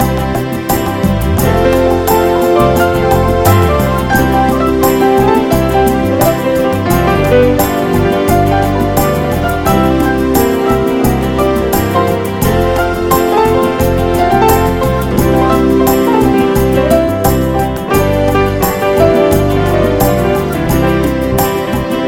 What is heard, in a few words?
Great Orchestra Sounds